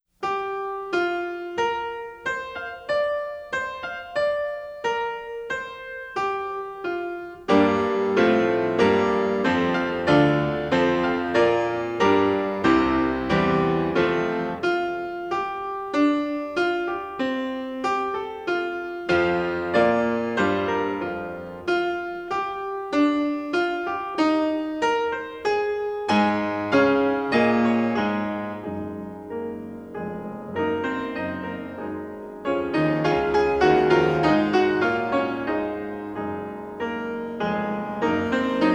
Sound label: Pictures at an Exhibition for piano Promenade; Mussorgsky